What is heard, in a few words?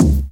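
bass drum, Rave Kick Snare, Percussion; Best Service ProSamples vol.37; Dance Synths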